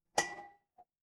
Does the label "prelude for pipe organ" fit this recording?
No